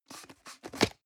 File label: plastic can small lid screw open hard